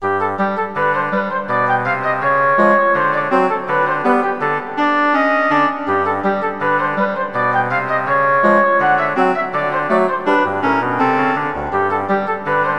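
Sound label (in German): spielmusik flöte klarinette fagott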